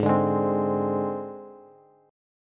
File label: Ab Major Flat 5th Ab Bass; AbMb5 strummed; JGuitar